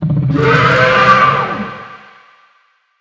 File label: Monster Death; Monster Lizzie Death